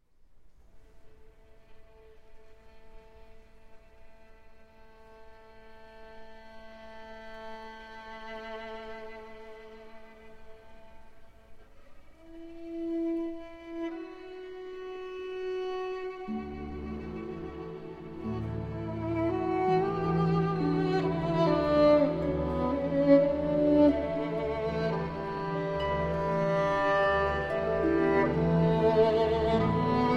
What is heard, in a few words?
Violin Concerto in D Major; 1. Rhapsody